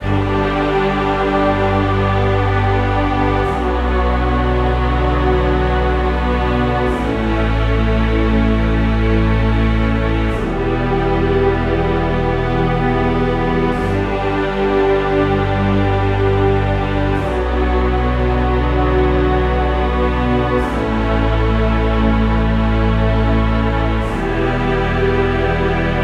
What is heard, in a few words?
Orch Ambient; Orchestral Ambient Music Pack